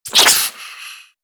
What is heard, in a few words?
Snake spit venom